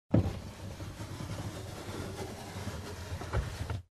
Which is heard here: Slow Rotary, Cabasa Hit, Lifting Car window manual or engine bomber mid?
Lifting Car window manual